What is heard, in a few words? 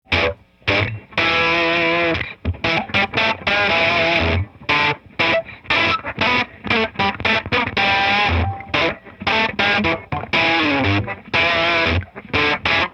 LP blues; bit quest